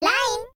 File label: alarm; LINE